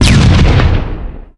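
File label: frag grenade explosion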